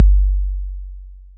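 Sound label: 808 bd, bass drum, drum machines; 808 Extended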